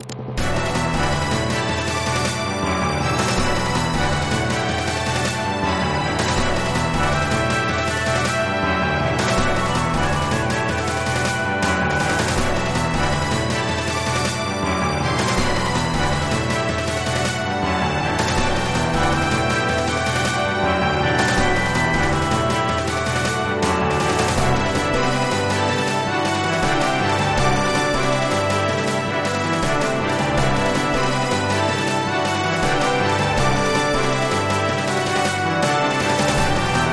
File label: Battle